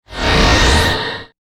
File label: windy scrape by; sound ideas